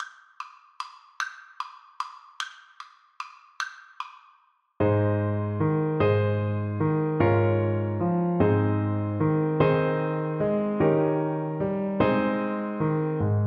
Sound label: tit willow, Saxophone